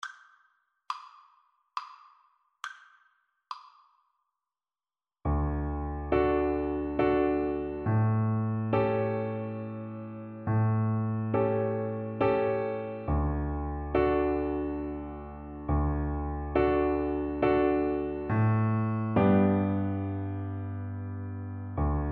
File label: happy birthday, Double Bass